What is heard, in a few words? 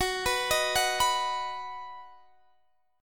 B-Major F sharp